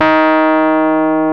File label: PNO, Rhodes Mk.V; Keyboards of The 60's and 70's